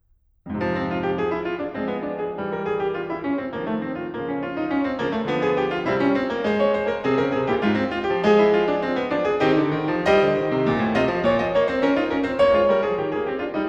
Prelude in F minor piano; WoO 55; Ludwig van Beethoven - Complete Works [Brilliant Classics 100 CD Box]

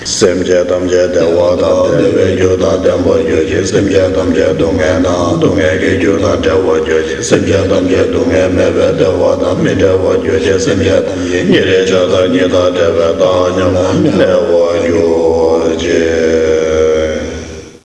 Four Immeasurables Prayer, monks; Tu Vo Luong; Namgyal monks

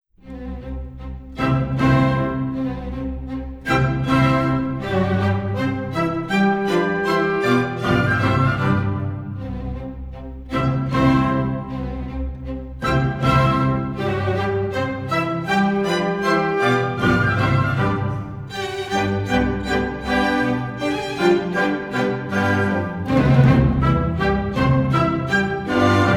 6 Menuets for Orchestra, In C major; Ludwig van Beethoven - Complete Works [Brilliant Classics 100 CD Box]; Dances II; WoO10

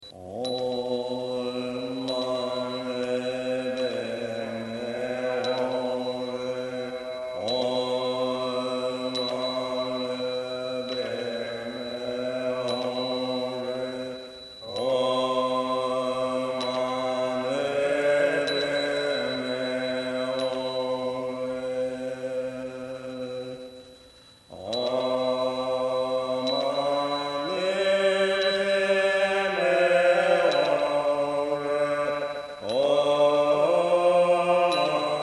Om Mani Padme Hum

